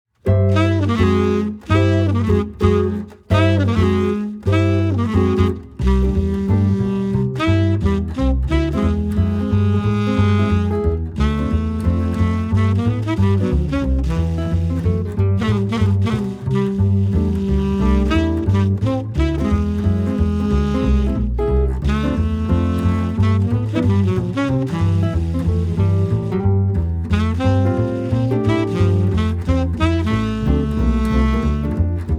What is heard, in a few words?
Swing Trio